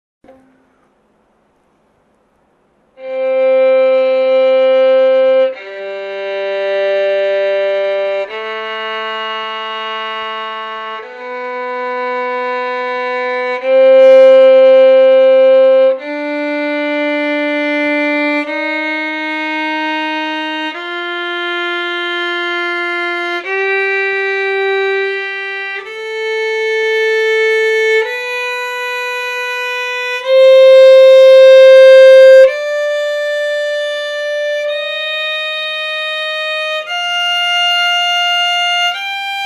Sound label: scale arpegios c moll